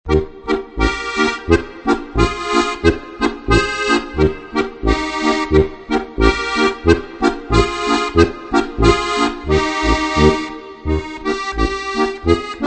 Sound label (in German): gstanzlform aus dem traunviertel steirische harmonika; 1000 beliebte Gstanzln